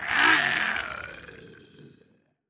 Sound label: Small Death